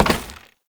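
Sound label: Oraxia Step Wood F, footstep on wood; Warframe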